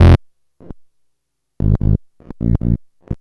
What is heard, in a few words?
Bass, Futurist Drum & Bass